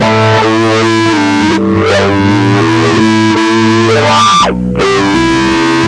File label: 083 dist guitar wah melody; GUITAR LOOPS